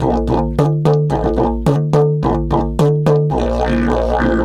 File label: 108, DIDGE 108BPM; Big Fish Audio - Didgeridoo and Other Primitive Instruments